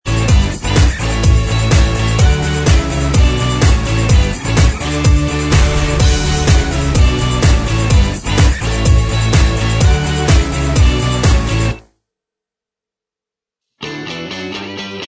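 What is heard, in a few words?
instrumental, backing track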